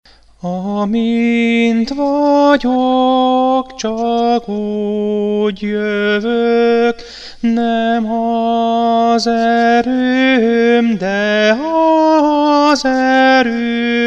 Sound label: tenor